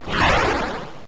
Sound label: Smack Down